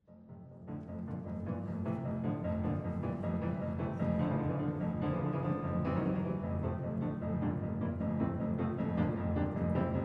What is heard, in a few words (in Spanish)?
Concierto para piano; No. 5 Camille Saint-Saens